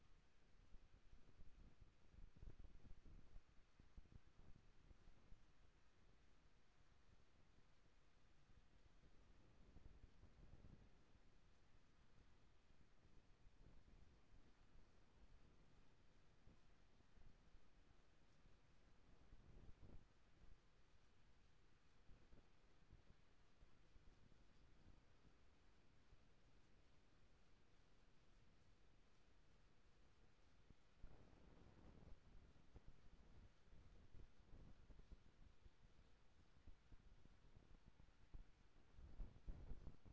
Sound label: HYDROPHONE 16000Hz; JASCOAMARHYDROPHONE